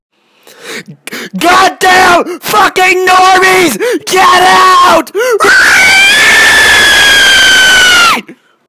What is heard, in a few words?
Reee